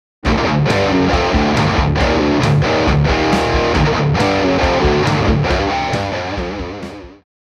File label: Met Drums